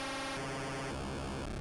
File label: Restore Down; Atari 2600